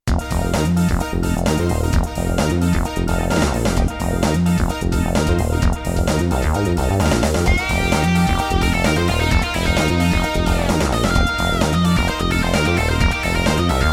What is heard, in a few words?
menu; f1spirit